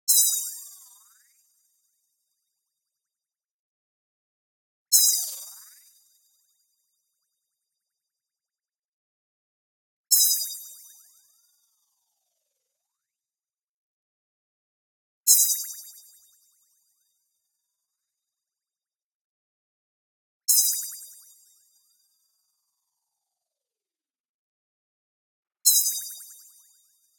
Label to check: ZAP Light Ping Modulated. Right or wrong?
Right